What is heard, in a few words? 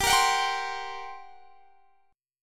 G Minor Major 7th Double Flat 5th G down; strummed; GmM7bb5